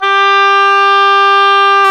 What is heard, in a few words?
oboe, mf f; Roland L-CDX-03 Disk 1; Oboe 2&3